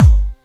KIT HOU; Best Service; Dance Mega Drums

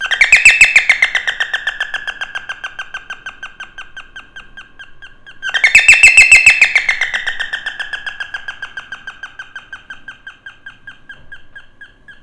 DOORBELL, Indian Traditions; USB Soundscan vol.45 - Indian Traditions; AKAI; AMBIENCE2

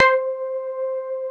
DAN, synthesizer bass; VFX-SD

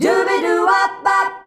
"DUBIDUWA" G, FEMALES

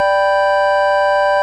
ORG VOX, Continental; Roland LCDP09 Keys of the 60s and 70s 1

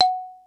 mallets

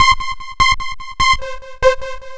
Synth, Trip-Hop Loops

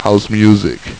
house music; Amiga Soundtracker Sample Packs

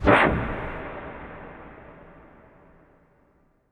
Impact Wipe, Cinematic

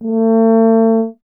tuba; Miroslav Vitous - Solo Instruments VOL-2

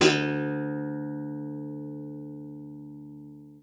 D2, guitar; Xsample VOL-2

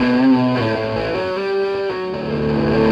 Krush, Electric Guitar

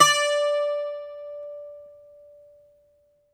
Greek Bazouki, Plucked Strings

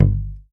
YAMAHA5 MUTE G1, Bass

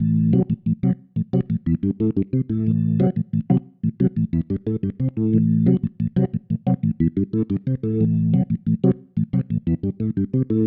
Pattern, Organ

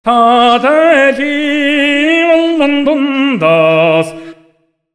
MONGOL, Asian Traditions; USB Soundscan vol.60; AKAI

